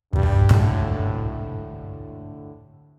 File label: quest finish; Iesabel